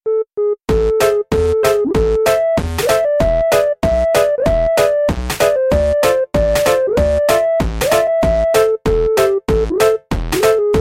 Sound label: 8 bit